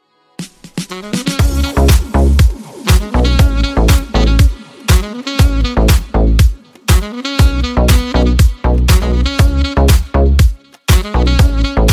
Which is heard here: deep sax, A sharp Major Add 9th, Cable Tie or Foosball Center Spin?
deep sax